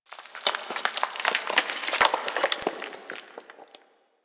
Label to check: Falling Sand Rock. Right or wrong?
Right